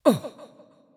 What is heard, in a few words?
Damage; Adora